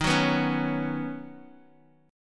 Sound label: Eb Minor 7th Sharp 5th Eb Bass Standard; strummed